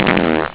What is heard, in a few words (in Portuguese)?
peido, sons humanos